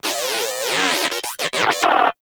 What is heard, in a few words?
Inquisitive Vocal Stomp; Pets; Warframe; Moa